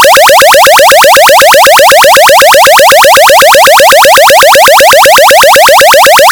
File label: escape; manic miner